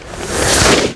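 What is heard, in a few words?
Caiman Giant attack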